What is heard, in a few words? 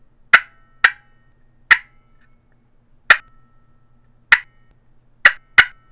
painted poplar maple boat paddle clucks, turkey; Southland Sounds